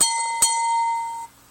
gas station bell; ringtones; sms

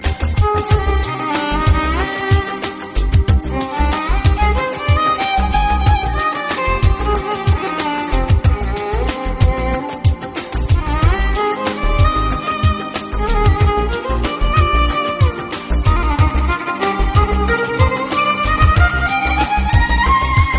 lounge, ringtones; blues

